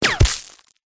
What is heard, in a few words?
warfare bullet whizz hit ground dirt small stones debris; zapsplat